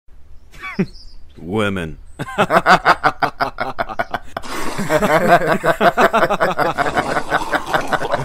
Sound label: WOMEN HAHA